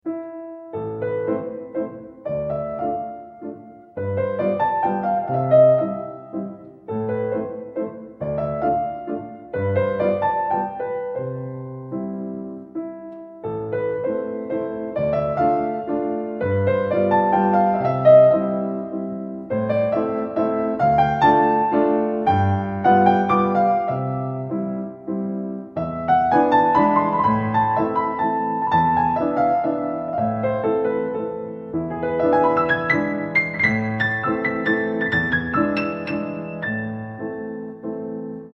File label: chopin waltz ringtone